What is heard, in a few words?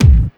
Kicks Loud